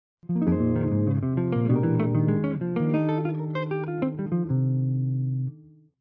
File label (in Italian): Arpeggi Irregolari